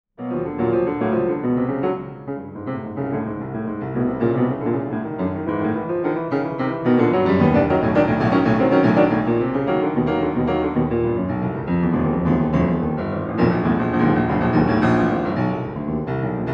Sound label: Piano Fast Flowing